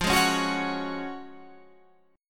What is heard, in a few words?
F sharp Major 7th Suspended 2nd F